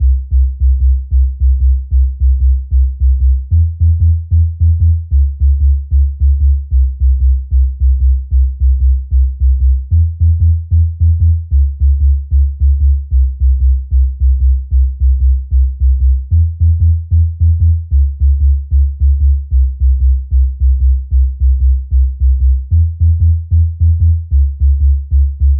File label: sub